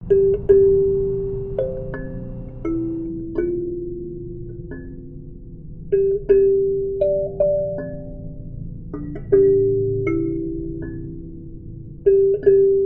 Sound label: kalimba